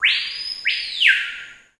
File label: Hawk Squeaks Whistle